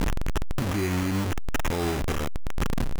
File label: Game Over; Super-Robo-Squad